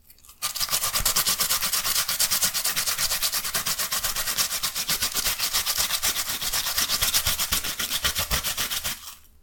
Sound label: grated radish; cooking